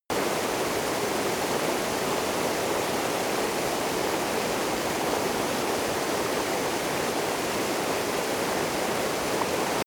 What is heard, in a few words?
river stream bubbles loop